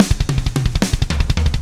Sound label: POWR, Backbeat, SHUF